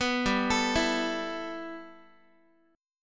G 6th Add 9th G Guitar, chord; G6add9 arpeggiated; 14,15,12,14,x,x

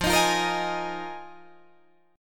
F Minor 11th, Guitar DADGAD

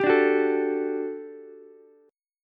G Major 7th Suspended 2nd G down Ukulele; GM7sus2 strummed

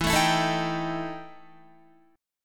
E Minor Major 7th Double Flat 5th, Guitar; EmM7bb5; strummed; 12,12,14,14,15,14

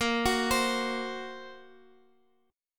Bb Suspended 2nd Sharp 5th Bb Guitar Standard; 18,21,22,x,x,x; arpeggiated; chord shape; JGuitar

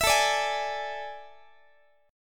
A Major 7th Suspended 4th A, Guitar; AM7sus4; strummed; x,x,19,19,18,19

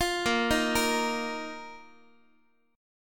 A sharp Suspended 4th A sharp down Guitar; x,x,8,8,7,9; 4A,4E,5A,5D,5G#,6C#; arpeggiated

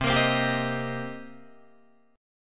C Minor 7th C Guitar Open D

